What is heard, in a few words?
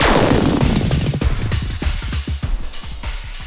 FX FILL